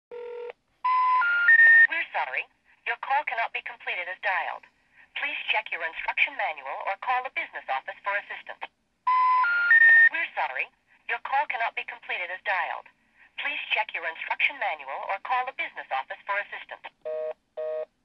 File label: feature call cannot be completed as dialed, Verizon